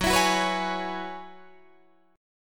F sharp 7th Suspended 2nd Suspended 4th F sharp down Guitar DADGAD